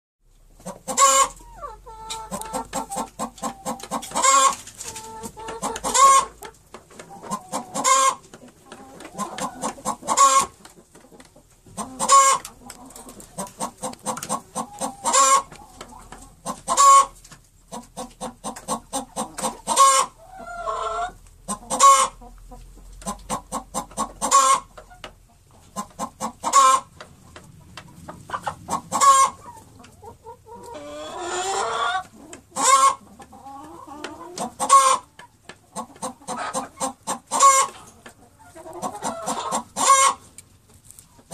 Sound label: spawning sound hen